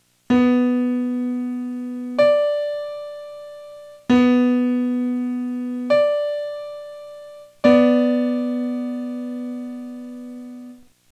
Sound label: Not Octaves, Pitch; Music by the Numbers